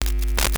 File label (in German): KNISTER, NOISE; Xsample VOL-9